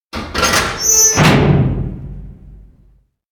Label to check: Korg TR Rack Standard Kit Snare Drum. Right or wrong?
Wrong